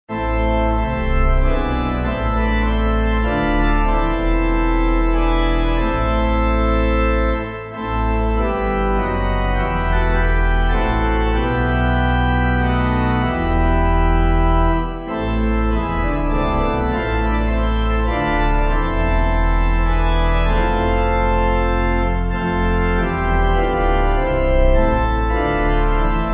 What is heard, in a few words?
O Quickly Come, Pipe; Organ; 8.8.8.8.8.8; Rhyd-Y-Groes